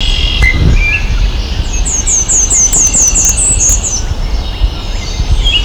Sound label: Hybrid Warbler; Birds